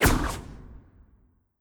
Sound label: Poison Dart Throw